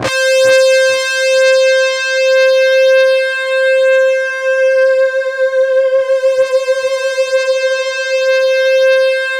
C4, ANALOG SEQ; Roland JV 2080

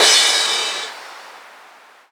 Crash, Cymbals

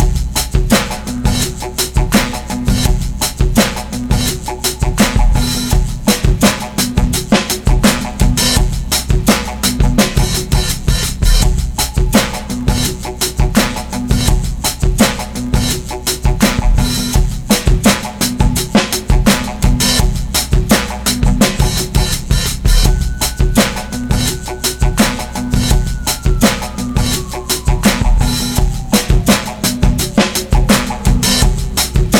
84 bpm, Breaks; 90 s Sample CDs; Planet of the Breaks

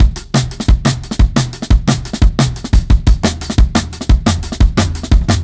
Rough Beat 4 Bar, Drum n Bass; KJ Sawka; Drum n Bass & Beyond

